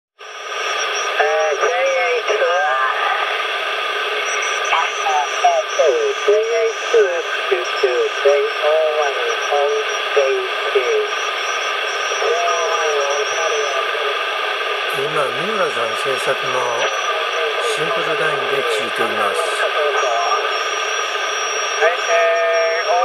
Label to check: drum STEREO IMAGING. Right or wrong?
Wrong